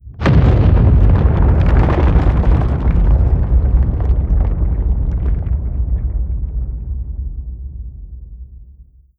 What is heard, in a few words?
land rocks; creatures; combat; strider; seq death